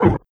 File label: Kick grunt; Cruelty Squad